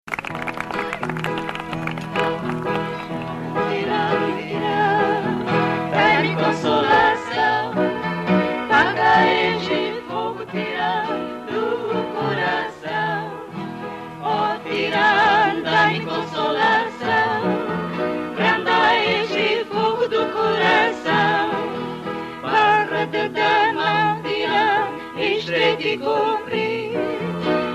Canto do Tyranno

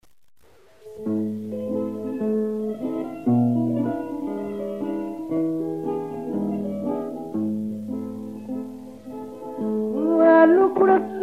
A desgarrada

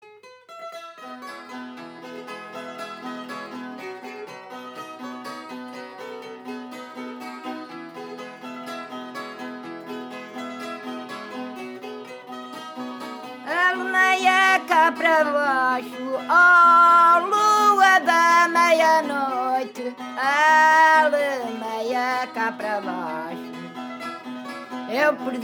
FADO

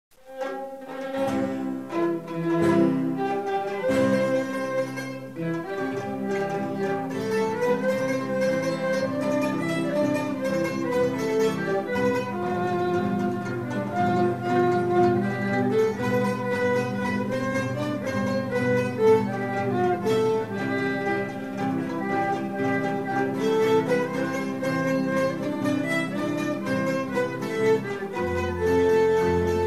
Marcha

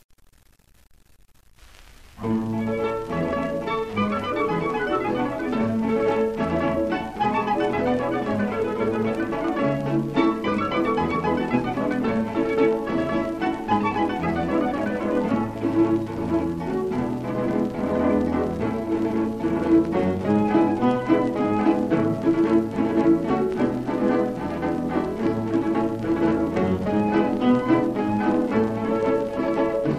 ORQUESTRA, Vira; revista Arraial

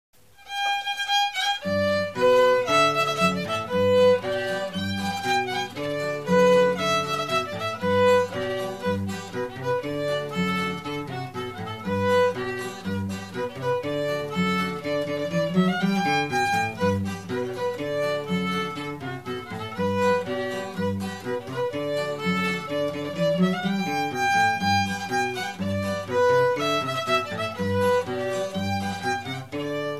Contradança

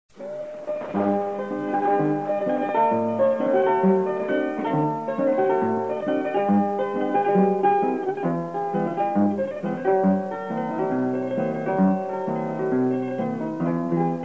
Fado dois tons

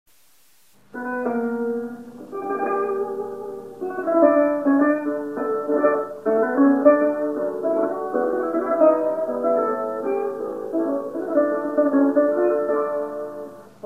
Fado

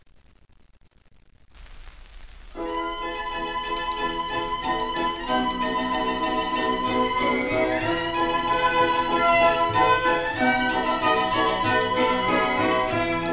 Cavaquinho

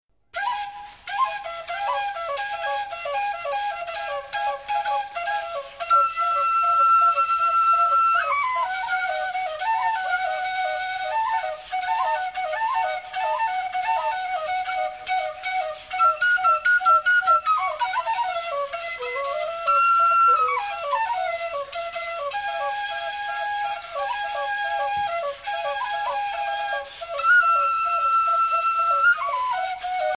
Toques só com apitos

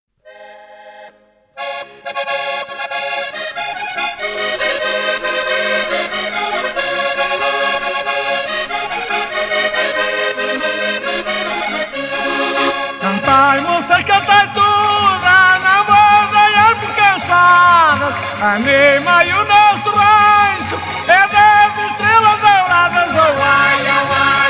Vira

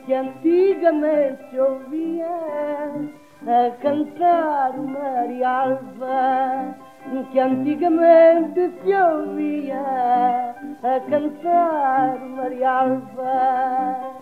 FADO

